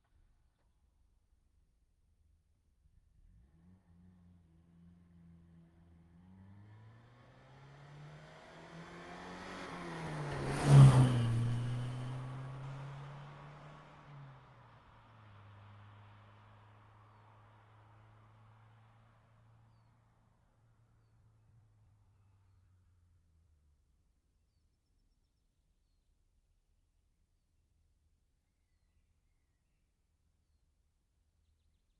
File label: saab 99 t9 ext passbys gearshifting ortf; sonniss gdc